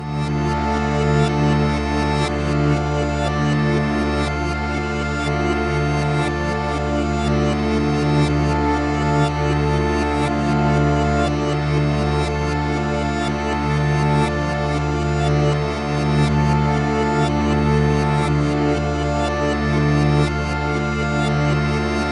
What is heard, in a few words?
Baroque Piece in Dm w. backwards